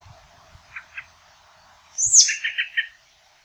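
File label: American Robin call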